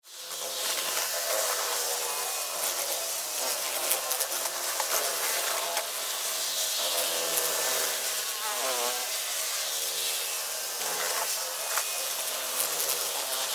Flies, Animal, Flies, Infestation, Wings, Fly, Insects, Bugs; 5.1; Surround Sound; BlastWave